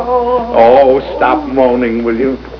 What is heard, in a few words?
Toast Moaning